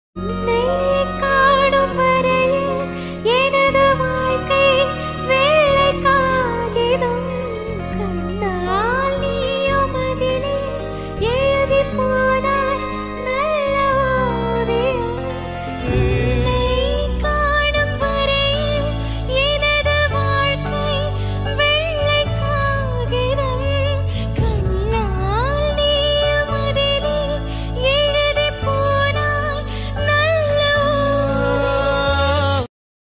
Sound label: singing